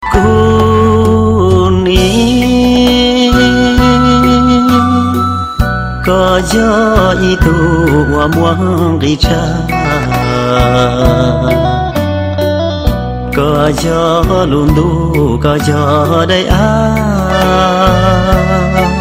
Hmong

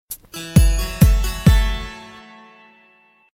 Chinese Tones; Chinese Tonessssss

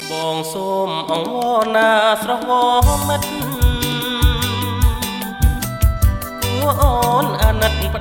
Khmer Rnb

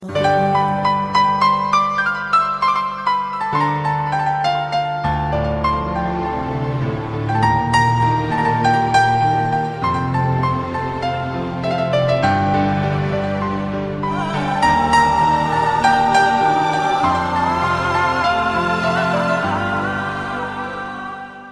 Romantic Sad

